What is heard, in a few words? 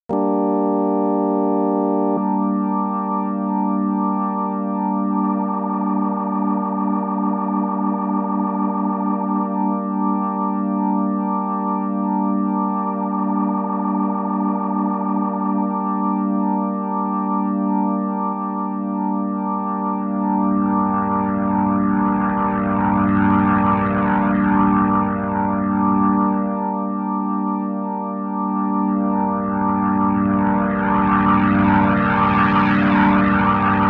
Nord Stage B3 Leslie